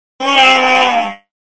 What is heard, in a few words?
death sound; lego darth vader